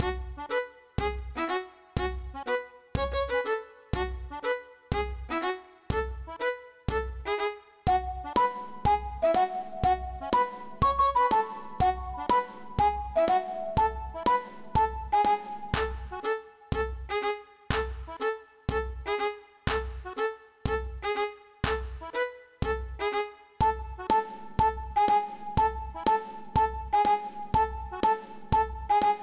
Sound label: Irish song